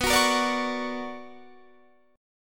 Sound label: B Minor Major 9th B Guitar Standard, chord; BmM9 strummed; 19,21,20,19,19,21; JGuitar